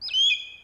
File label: eagle; ambient; anubis